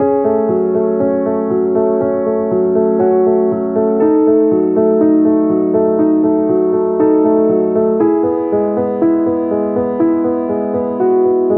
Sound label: EMOTION PIANO LOOPS, 120 BPM, E